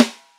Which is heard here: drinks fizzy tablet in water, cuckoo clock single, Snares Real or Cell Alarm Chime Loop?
Snares Real